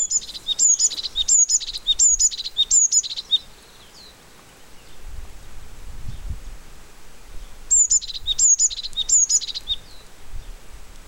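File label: P cyanus song, background E spodocephala song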